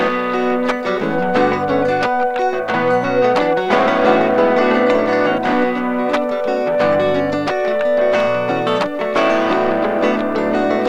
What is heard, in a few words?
ride